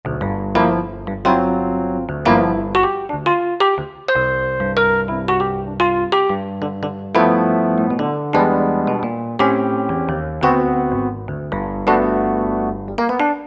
Suitcase

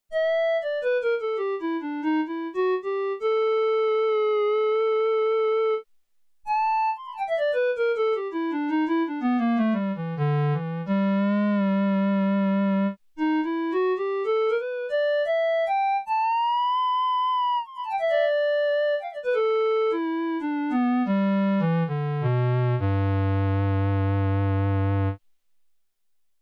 CLARINET, SIEL DK 80; Factory

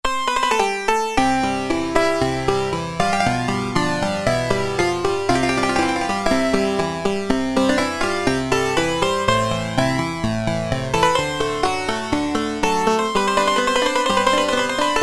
Harpsichrd, Roland MKS-50; Factory examples

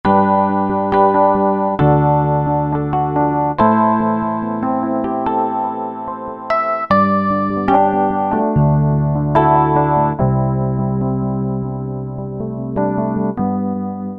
EP, Yamaha TG500

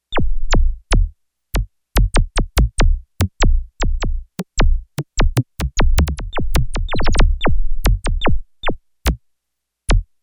Gated FX; Digital Synthesizer

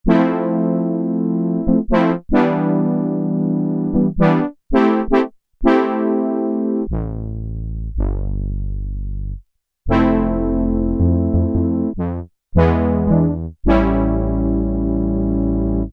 Muted Brass, Korg Poly-800

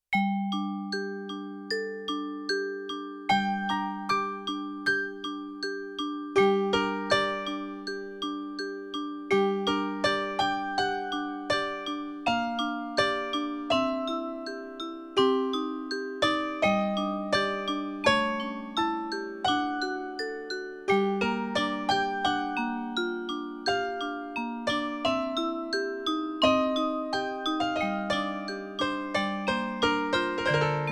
Piano Vibes Split; Yamaha TX16W; Jazz Performance; Jazz Performance 2

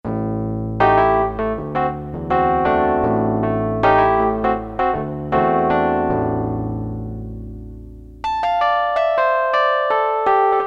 E. Piano, Electric Piano, Korg DSS-1

